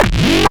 bass; EZRollers

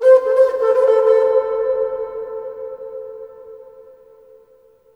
HRNPHR, Heart of Africa; AMB. HRN FX

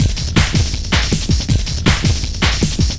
LOOP, Eurotech; Sounds Good

